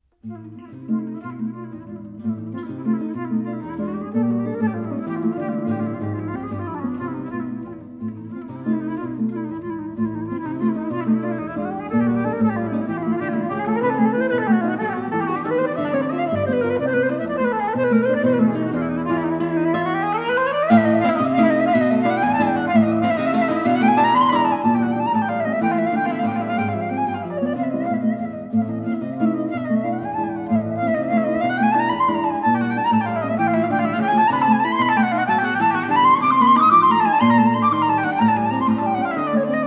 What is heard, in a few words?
sonata